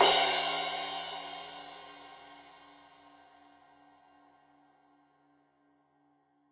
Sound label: Crash 15in L edge, Cymbals Stereo